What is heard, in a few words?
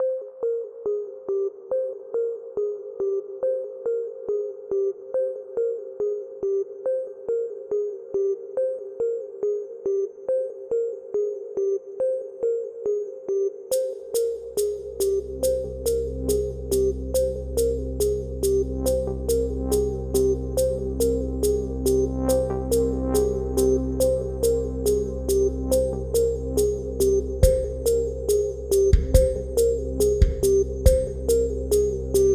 Everfree Forest (Ambient Electronic)